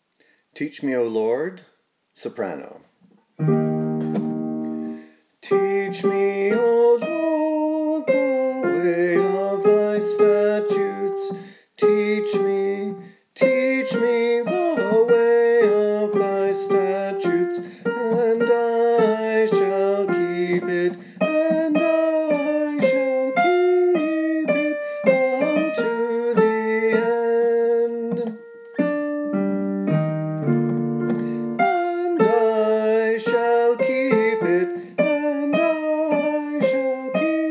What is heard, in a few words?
Teach me Soprano; Attwood